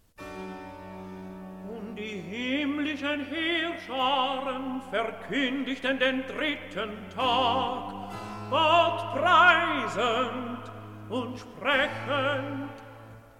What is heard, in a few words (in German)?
Rezitativ; No. 9. Und die himmlischen Heerscharen verkundigten (Uriel)